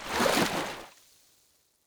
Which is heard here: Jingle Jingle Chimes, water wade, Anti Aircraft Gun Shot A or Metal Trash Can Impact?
water wade